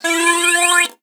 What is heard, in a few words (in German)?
TROETE; Xsample VOL-9